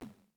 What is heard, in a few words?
player shoot